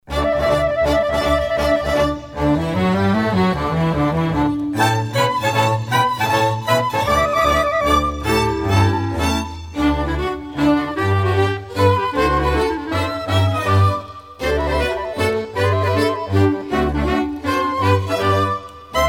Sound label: MAZUR; nowahuta